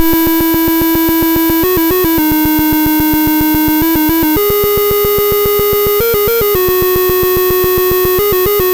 Apple II Synth, Chiptune

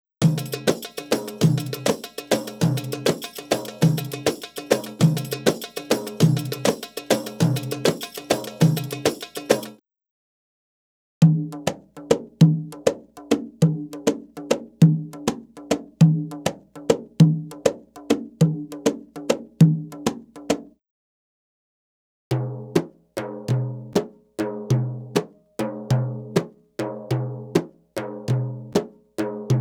Arabic Rhythms

